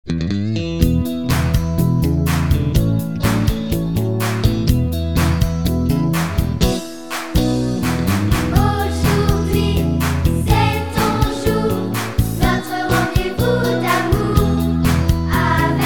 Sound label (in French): Chants Paroisse